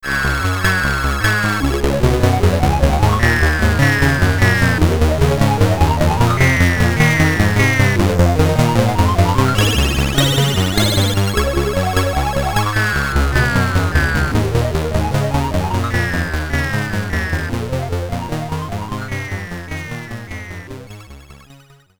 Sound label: Title Screen (SID Stereo); IO; Commodore 64